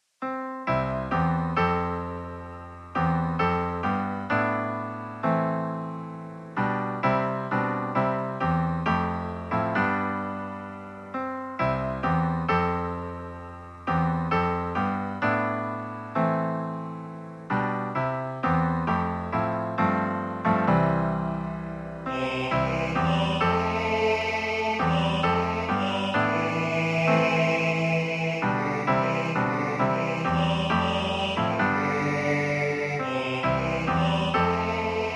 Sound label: Alto; I Heard The Bells